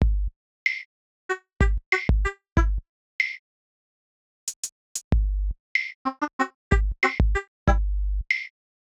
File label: bgm 94bpm CMaj; 14beats; colorlab head; chicory a colorful tale; ps4